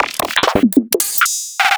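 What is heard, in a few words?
Roland MV8800 drum STRETCH KIT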